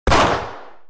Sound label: pistol; pixel gun 3d